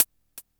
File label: hi hat closed, Korg Electribe ER-1; Kit 4